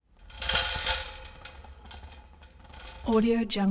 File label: ball rolling in metal cage